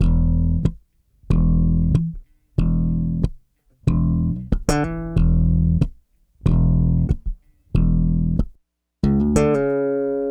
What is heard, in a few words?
bass, analog funk; Mixed Berry Jam; Sonic Foundry (Sony Creative Software) - What it Is! 70s Analog Funk